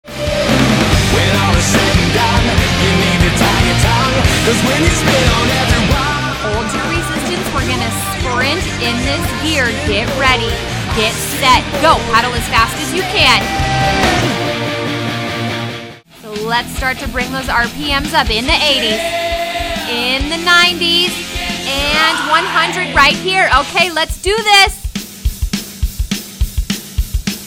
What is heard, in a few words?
Rock Class Sample; ICOD Rock45